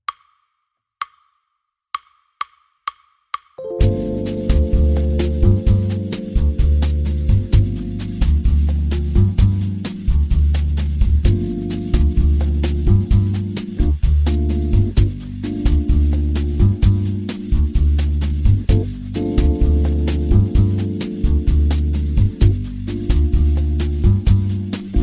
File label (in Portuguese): Base Bossa Nova Gm bpm 129